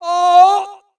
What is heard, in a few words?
yells